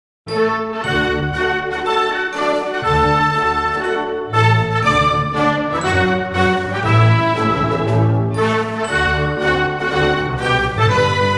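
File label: anthem of Panama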